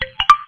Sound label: Notification Ringtone